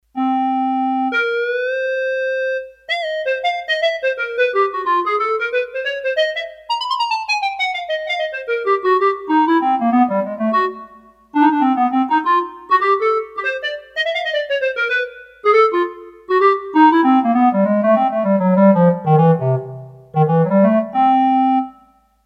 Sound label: Master Clarinet, Roland D-10; Factory Patches